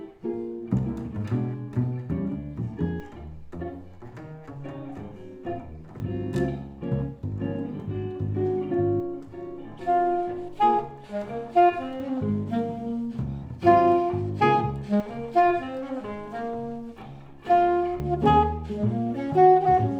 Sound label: jazz minus x L Low